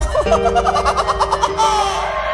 risada; hermes

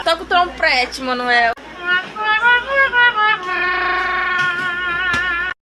toca o trompete

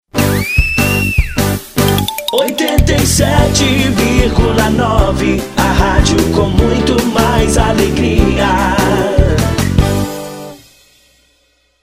vinheta cantada

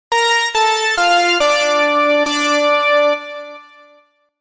vinheta; corinthians

